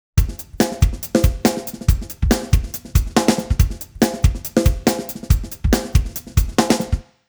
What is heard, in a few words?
drums, timestretch 75; digidesign pro tools xform